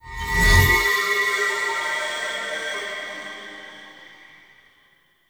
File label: Puzzle Beacon D; Warframe; Ambience; Duviri; Bombastine Encounter